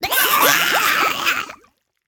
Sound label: Death; Shifter Zerus